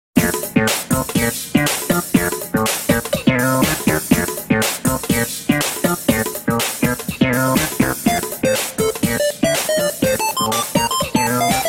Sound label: Retro; SM3DL